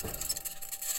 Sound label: mic, mars2020 edlcam